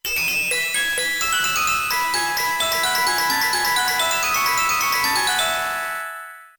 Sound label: mobile phone ringtone